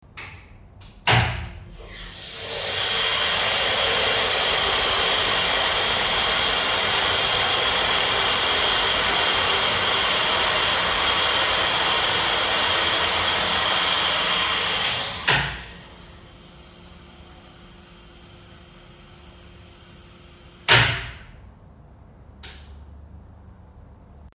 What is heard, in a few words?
hydraulics chamber down